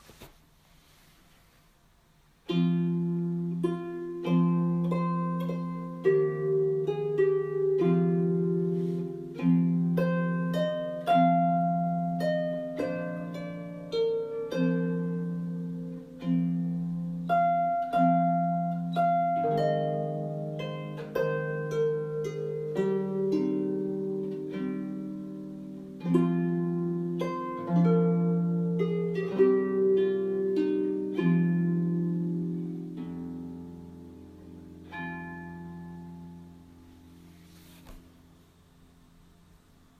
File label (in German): Scarborough Fair trad England, Harfe